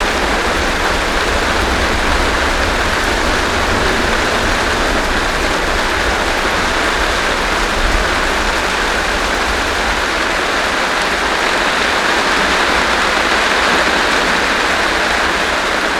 GF Rain; gnarled forest

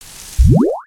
Sunflower Spawn; weapons; aronwk collection; ndaudio

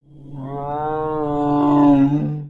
Pet Lion Howl; creatures pets

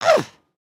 shovel; emotes

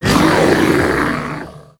Thwok yell; Summoner

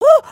freak out, emotes

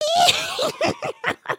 giggle

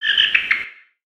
Peaceful Bird One shot

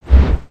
jet pack sweetener; emotes

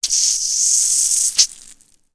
Gnarled Torch Water

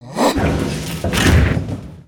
Gorilla Jump Slam

